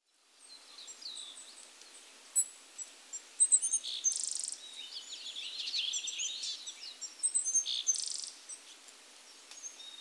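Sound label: willow tit; kogara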